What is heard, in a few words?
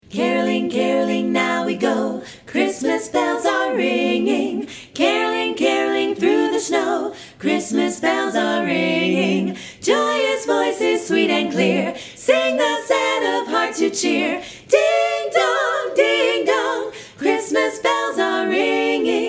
Caroling